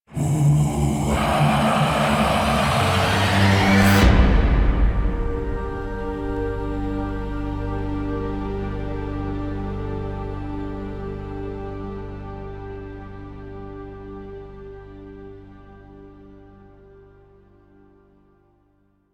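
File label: Combat Quest Intro, Music; Warframe; DE; Dynamic Music; Entrati; Octopede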